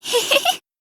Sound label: "hehehe"; shirokuma